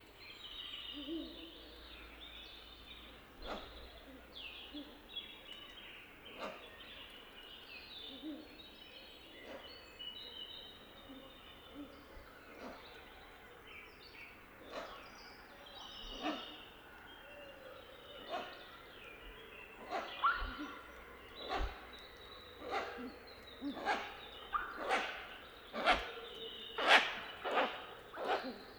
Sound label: Ural Owl Food Exchange With Compound Hooting Of Male Soliciting Calls Of Female